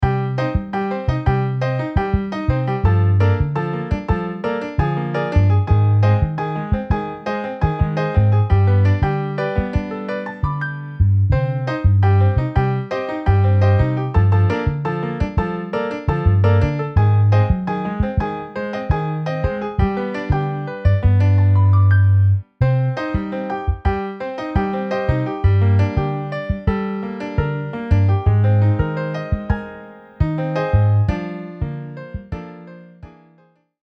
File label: Piano Bass MIDI